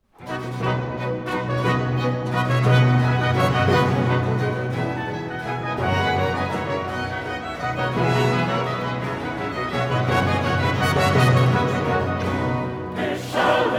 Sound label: Coro; Bach; Kantate BWV172